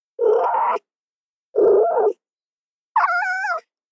spirit fox chitter (ugly)

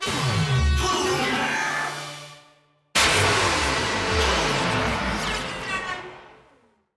Winstreak Loss

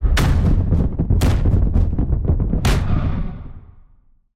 Drum; Volibear

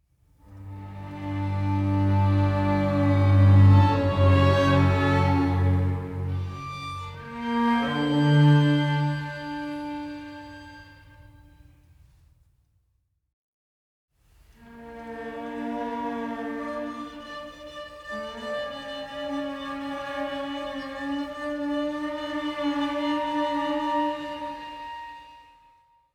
Strings

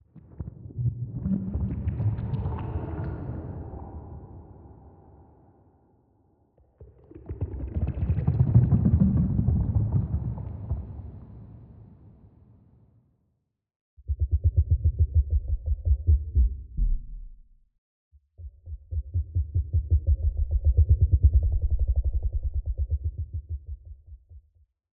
Processed Stomach

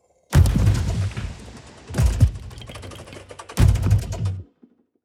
Processed Laundry Machine

